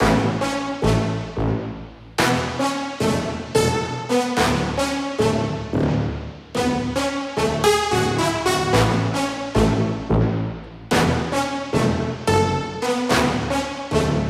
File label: Brass Loop 110BPM Amaj; Brasses; Instrument Loops; 99Sounds; Monosounds